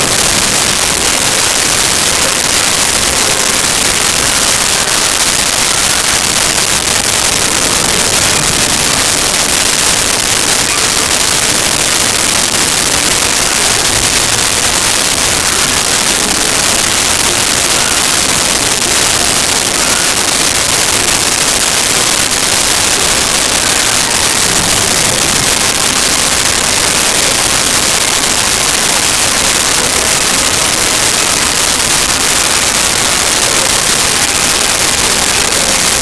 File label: battle loop, Music